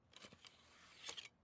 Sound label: archery foley arrow slide